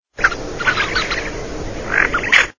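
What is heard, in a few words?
tufted duck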